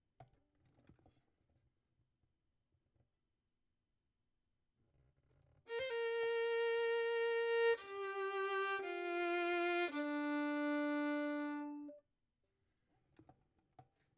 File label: Violin; Verdigris Music